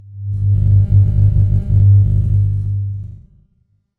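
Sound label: light beam; luch sveta; DndGamePlayer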